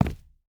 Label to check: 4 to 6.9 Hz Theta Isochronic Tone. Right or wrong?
Wrong